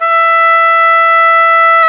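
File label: piccolo trumpet; Roland L-CDX-03 Disk 2; Picc.Tp 1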